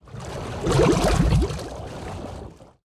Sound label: Underwater Movement Play Underlay